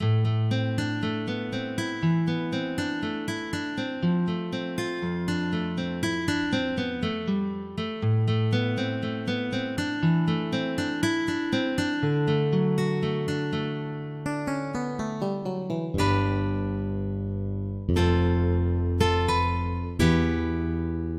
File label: Land King Hall (Resynth)